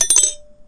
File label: bottle top; Weird and Interesting Hits; single hits